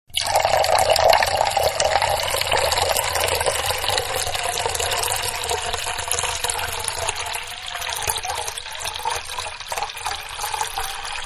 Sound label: Water-Pour Into Large Bowl; Pouring (Sound Effects:Objects); Manhattan Production Music